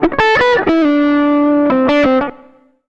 SOLO; Univers Sons; Jazzistic

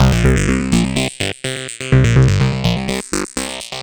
synth 125 quadflt C Ab G; Transmission-X

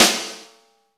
SNR, Snare; Club-50 - Foundations Roland; Ext. Snare 4

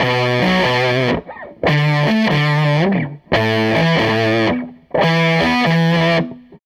SG Guitar Loop

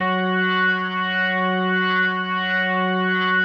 F#3S, B3, Vintage Keyboards

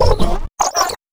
robot, glitch

